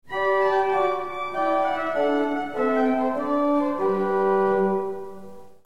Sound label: gt prin, theatre organ